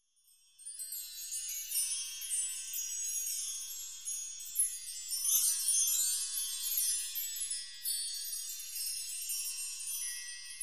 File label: Bar Chimes